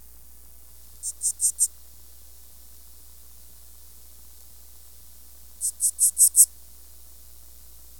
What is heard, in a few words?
curve-tailed bush katydid; Insects